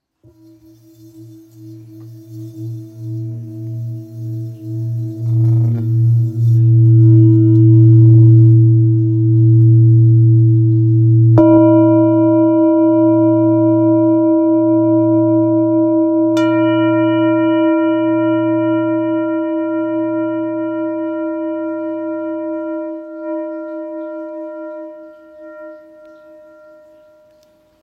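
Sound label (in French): Thérapeute LA, bols chantants tibétains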